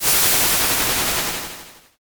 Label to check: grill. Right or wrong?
Right